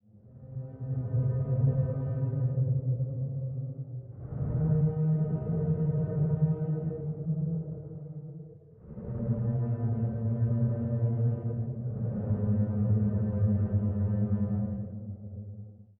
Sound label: Danger Tonal; Insanely Twisted Shadow Planet